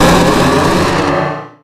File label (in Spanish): Grito de Mega-Beedrill